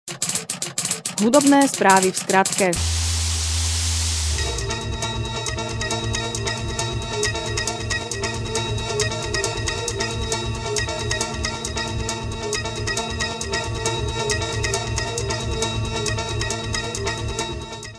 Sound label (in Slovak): jingle, hud spravy v skratke